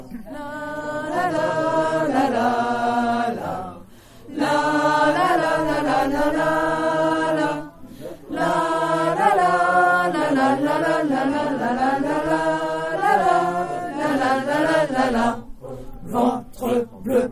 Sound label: lalala